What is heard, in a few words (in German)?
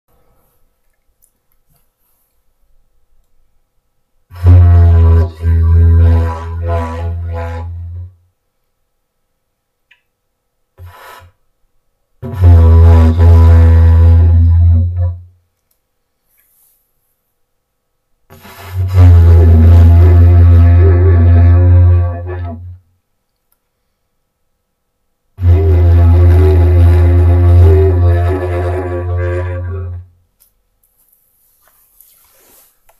Didge Versuch